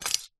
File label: office set squares x2 plastic pick up from wood